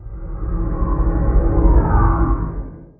elder idle, guardian; minecraft; mob

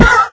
hit, horse; minecraft

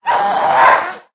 scream, ghast; minecraft

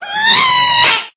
scream, ghast; minecraft

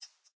idle, rabbit; minecraft; mob

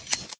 shear, sheep; minecraft

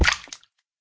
land hit, guardian; minecraft; mob

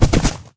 gallop, horse; minecraft

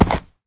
jump, horse; minecraft

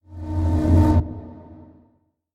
unfect, mob zombie; ayuncraft-1.5

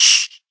hit, silverfish; minecraft

